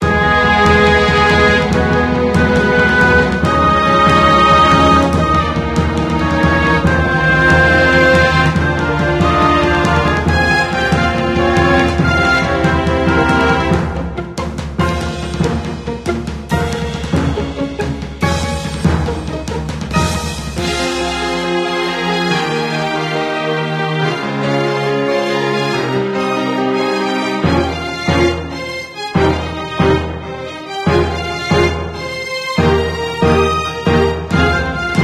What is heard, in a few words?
Dungeon; Miden Tower; Android